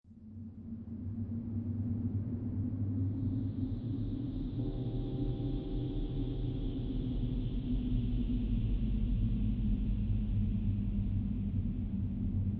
Fantasy Cemetary Or Graveyard Ambience Alternative Version With Fewer Sounds; Horror ambience or fantasy ambie; Digging